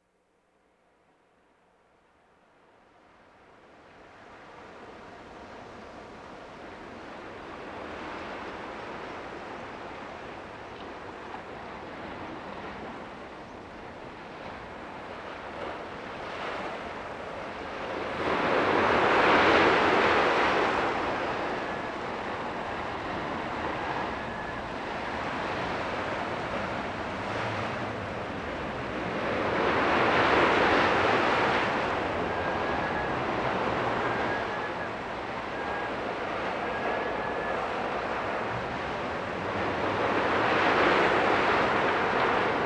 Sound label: Brainwave Suite - mid-deep delta